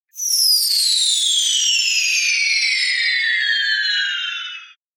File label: Falling Bomb, Whistle - Shorter Fly; Hollywood Edge; sound designer's toolkit v2